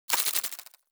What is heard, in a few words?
Card Error; UltimateFishing2020